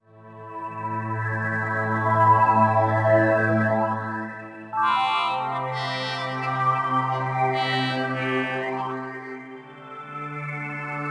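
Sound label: vegas ambience loop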